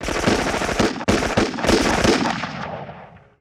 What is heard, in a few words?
Machine Gun Multiple Short; Weapons & Firearms; Sony Pictures Sound Effects Series Volumes 1-10; Sony Creative Software